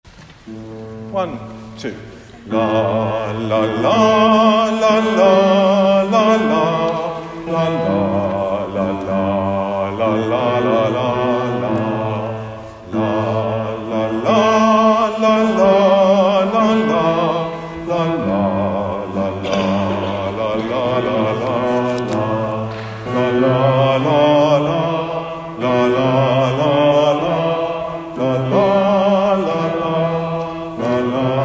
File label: bass; Pastime